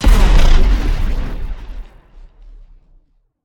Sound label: spell explode; smite; osiris